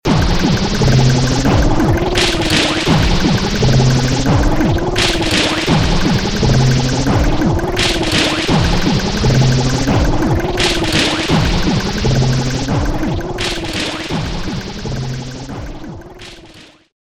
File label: Factory